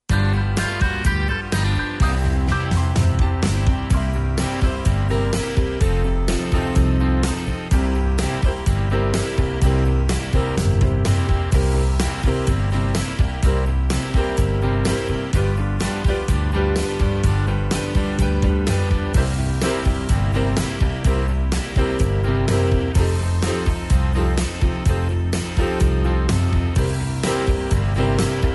rhythm track, square dance music

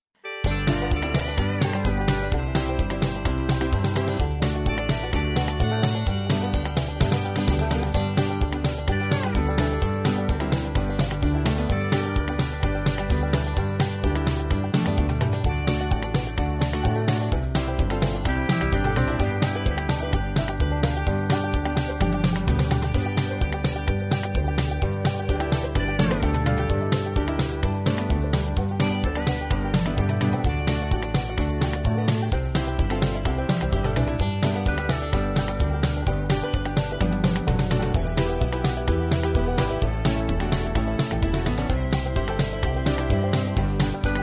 hoedown, square dance music

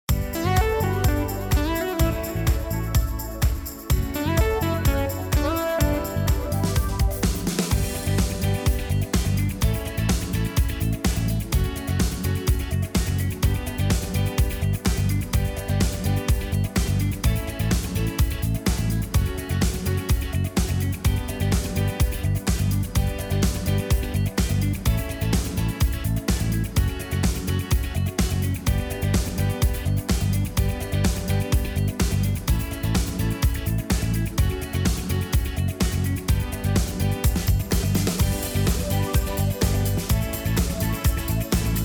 rhythm track, square dance music

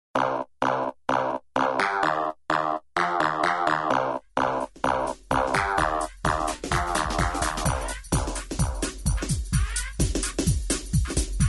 hoedown, square dance music

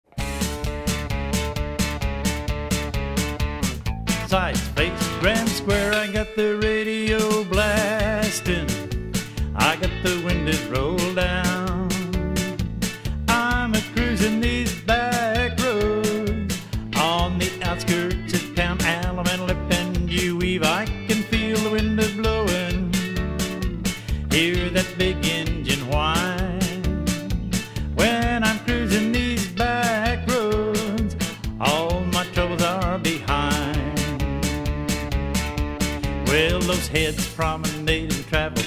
back roads vocal; square dance music

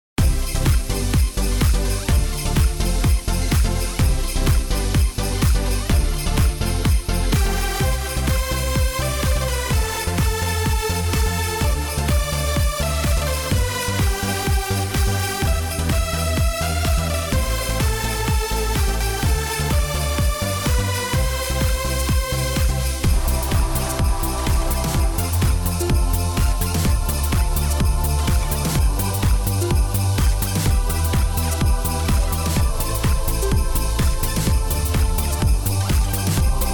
christmas patter with bells, square dance music

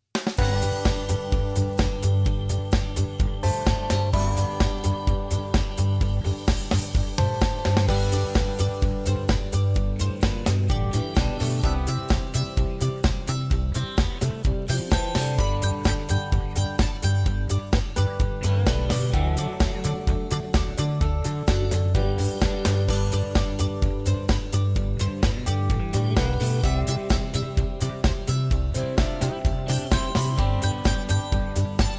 patter with low leads, square dance music

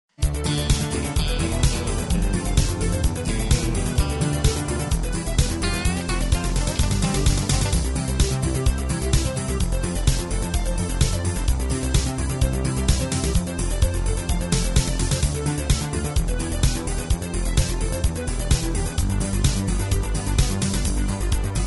hoedown, square dance music

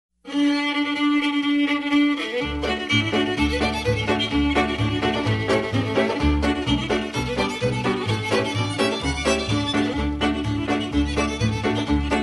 durangs hornpipe, square dance music

